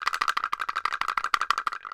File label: kpoko, Kenya Kpoko Kpoko